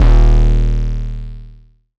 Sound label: 808 Bass Hardstyle E3; Slate 808 Deep Dive; SD Signature 808s